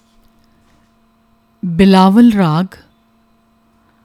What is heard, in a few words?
Bilawal Raag